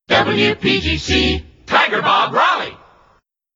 jingles; pams

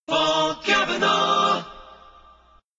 jingles logoset accapella; priority one

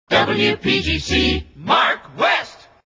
jingles; pams; mark west

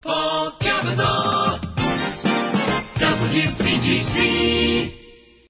jingles logoset; priority one

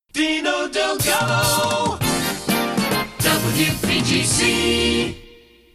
jock jingles

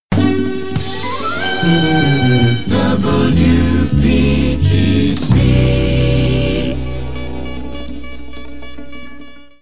jingles image pack remix full logo slow

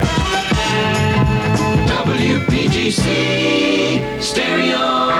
jingles; drake aa image pack originals

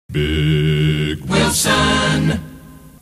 jock jingles biiiiiiiiig; pams series 42a; wilson

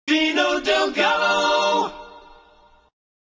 jingles logoset accapella; priority one

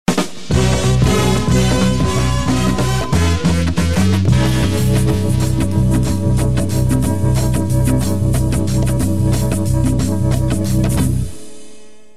jingles image pack remix long band track

